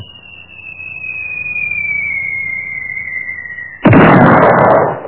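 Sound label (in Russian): бомба падает и взрывается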